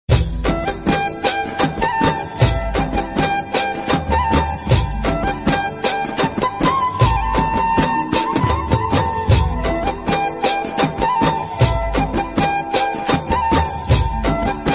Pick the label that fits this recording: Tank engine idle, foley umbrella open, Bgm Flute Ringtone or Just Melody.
Bgm Flute Ringtone